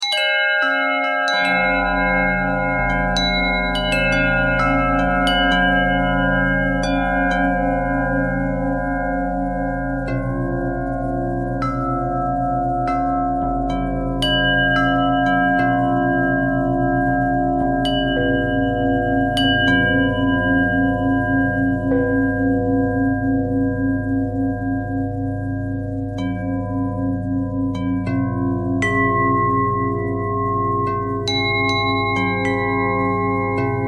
bell bell thread simple, bells

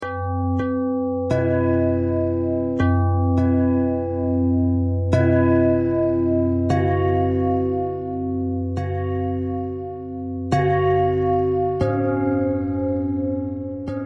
bell thread pentatonic

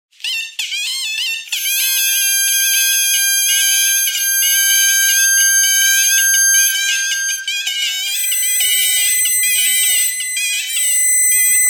bagpipe radio thread noise

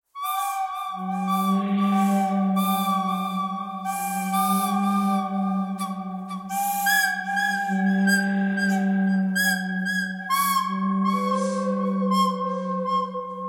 bird canyon thread pentatonic

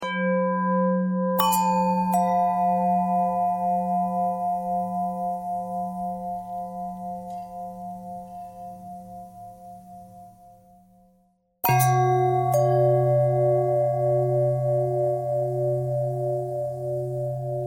long bell bell thread noise; bells

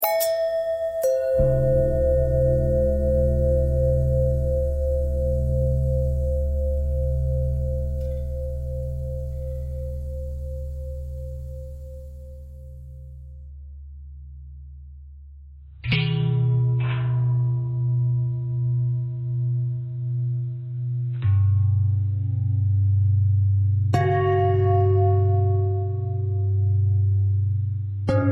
bell long bell thread simple, bells

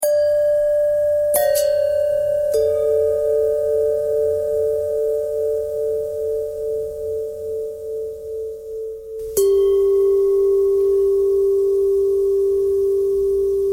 long bell bell threads, bells

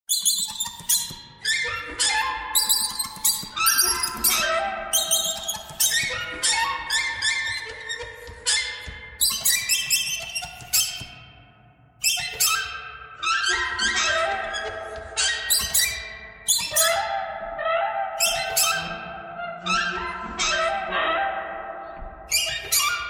birds thread noise

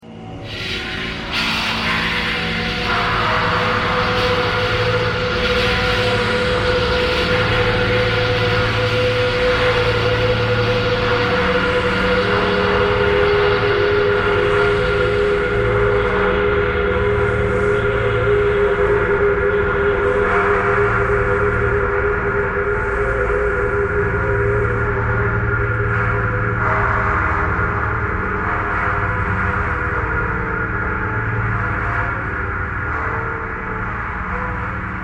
traffic core birds thread pentatonic

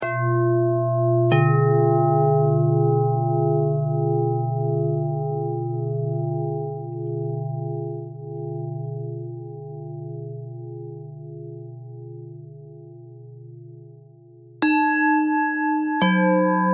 bell thread pentatonic

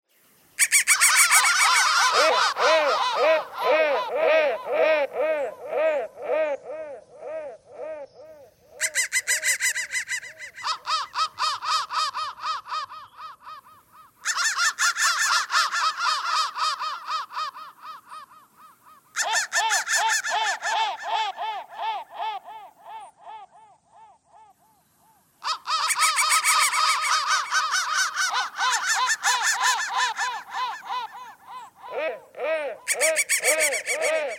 crow thread noise

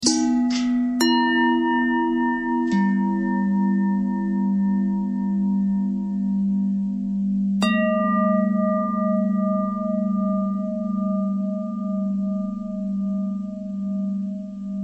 bell long bell threads; bells

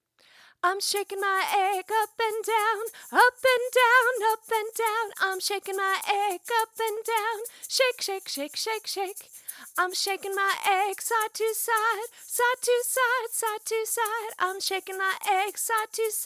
Shaking My Egg

